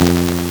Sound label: LSDj, Nintendo Gameboy; 300 Drum Machines